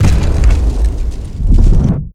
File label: ambient large crystal station death; Stellaris